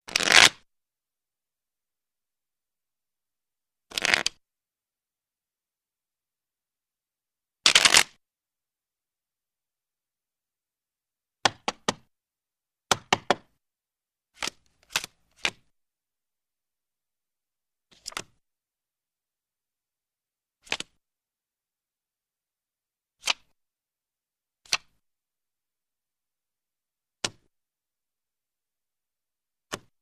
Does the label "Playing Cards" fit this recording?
Yes